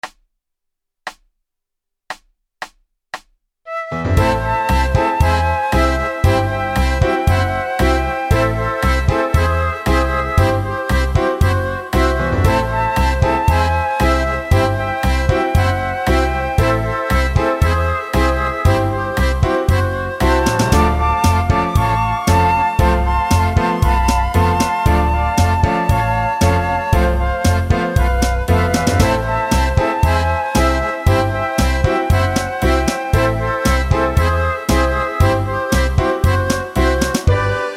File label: Flute Tango Chromatique; Fluitboek; Flute Time vol.1